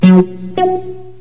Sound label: alarm, timer